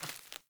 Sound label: Sandals Run Vegetation, Footsteps